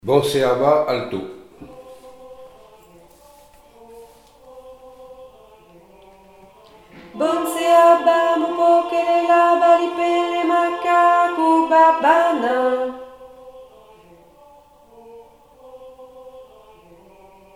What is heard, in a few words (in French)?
chant alto; Bonse Aba